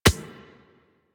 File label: Beach Ball Floof Bounce Dirt